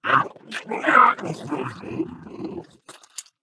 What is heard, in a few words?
ballbot crushed